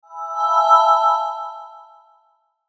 Sweeper Bell Ring Delay; Production Element Imaging Element Accent Transition

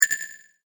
multimedia rollover, Multimedia Rollover Tone; McKinney Sound

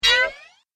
Multimedia Swipe, transition

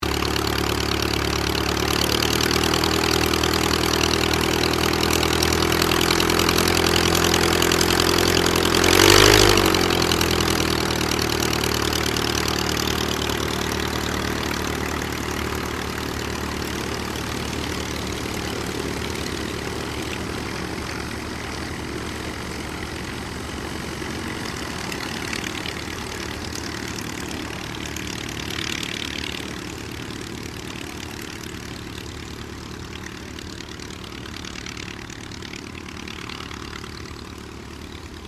AIRCRAFT AUSTER III TAXI; Airplanes; Vehicles; Airplane Sound Effects

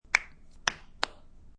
Three Knuckle Cracks; Knuckles crack; Human

